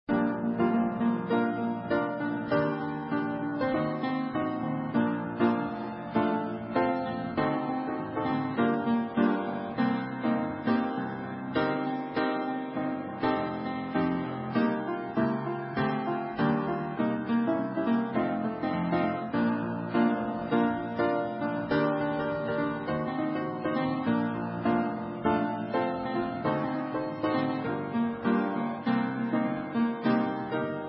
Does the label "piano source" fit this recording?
Yes